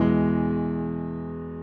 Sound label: piano low